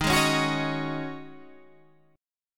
Db Suspended 2nd Suspended 4th Eb

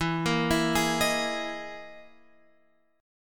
E Major 7th Flat 5th, Guitar, Open F, EM7b5 chord; arpeggiated; x,11,10,11,11,10; JGuitar

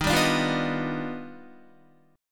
Eb Augmented Major 9th Eb down Guitar; Eb+M9 strummed; JGuitar

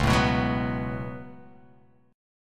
C sharp 7th Suspended 4th Sharp 5th C sharp

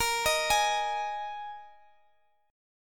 Bb 7th Suspended 4th Bb down Guitar Standard; arpeggiated

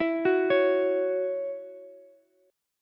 C sharp Minor Double Flat 5th C sharp Ukulele; C#mbb5; arpeggiated; JGuitar

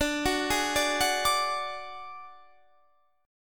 D Major Flat 5th D Guitar Standard, chord; arpeggiated; JGuitar

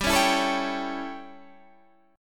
A 7th G, chord

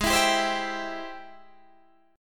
Ab Augmented Major 7th Ab Guitar DADGAD

